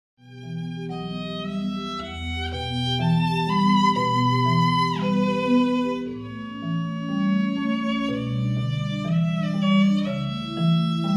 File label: Meditation